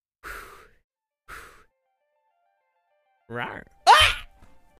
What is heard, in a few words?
rawr detune